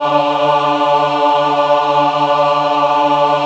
D3 MW AH, Choir; USB Soundscan vol.28; Acoustic & Synth; AKAI

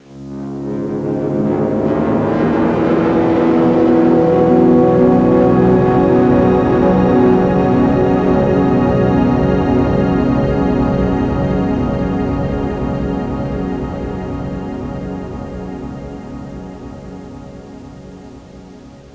Space Echo, Psychedelic Guitar; Hip-Hop Street Beats Construction Kit

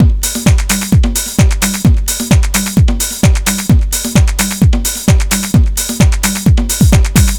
DRM, LOOPS 130, House; Best Service ProSamples vol.10 - House; AKAI

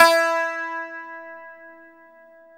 TZOURAS E 3; Soundscan vol.53; Mediteranean Traditions; AKAI

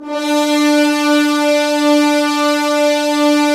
Horns; Roland - LA Composer vol. 4

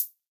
HH, Dance Drums

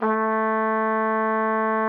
A3, TRUMPET; Xsample VOL-3

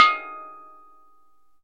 steel drum

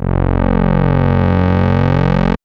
MOOG B 1; AMG - Vince Clarke Lucky Bastard

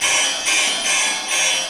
shake loop; Rarefaction - Poke in The Ear With A Sharp Stick

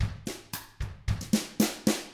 COUNTRY, Drums; ILIO; Double Platinum Drums 1

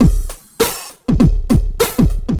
drloop, drumloops, Vinyl Attack; Masterbits

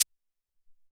Tek Hit

